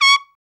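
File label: BRA TRUMP, trumpet, brass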